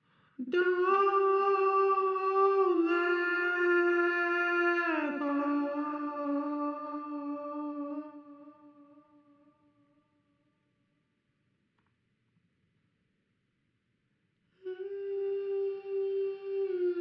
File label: jazz, 90, Gm, Acapellas